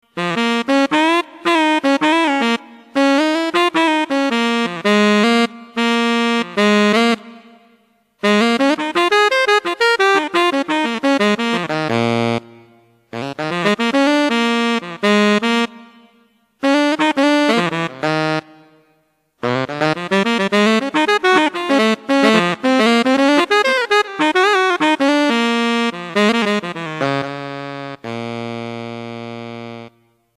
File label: blues